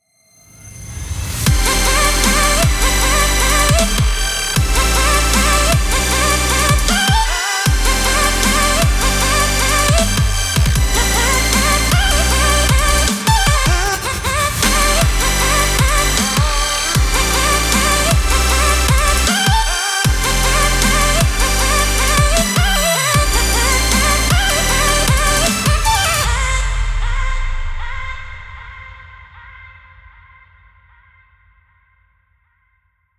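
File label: Mix Master FX Tape On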